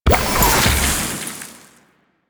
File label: infested mass appear; EndlessCapture